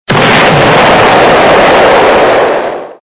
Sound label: Explosion